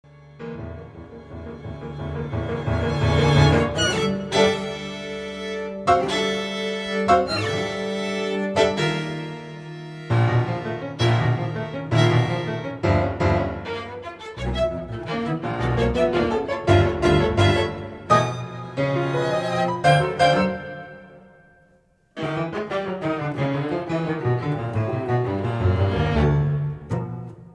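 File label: piano quintet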